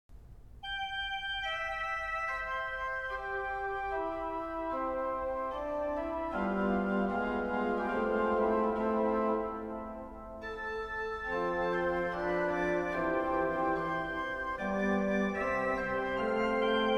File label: voix humaine, organ